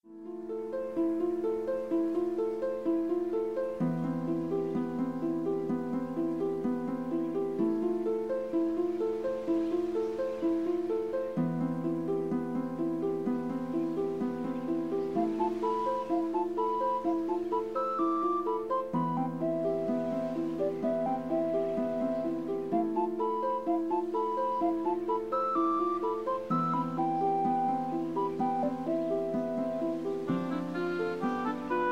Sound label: wood; PhoenixCraft-1.16.5; ambience music